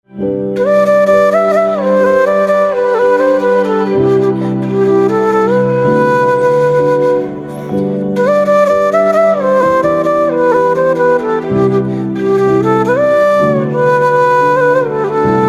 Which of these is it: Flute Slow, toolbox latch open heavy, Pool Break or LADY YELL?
Flute Slow